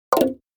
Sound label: Casino slot machine scatter symbol